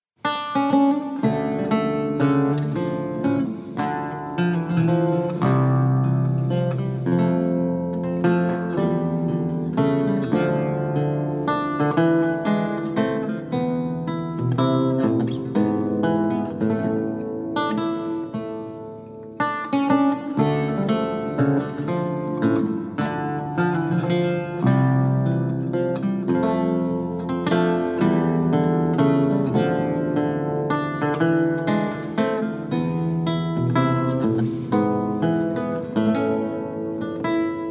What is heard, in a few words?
Courante